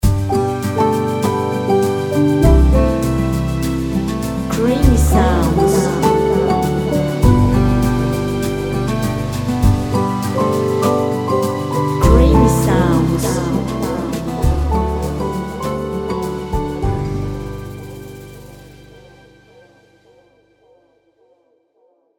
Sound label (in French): creation musicale nature ecologie